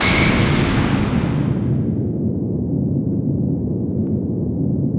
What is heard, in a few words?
IGNITE, Impacts, Hits & Booms; Distored Reality